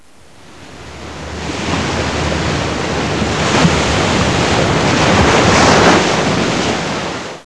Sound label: WAVES